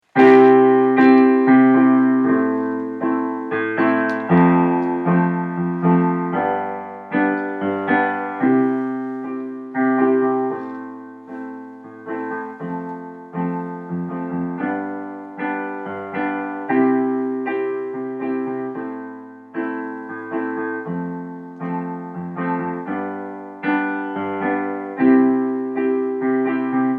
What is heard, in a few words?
accomp; dhs greaseaudition boys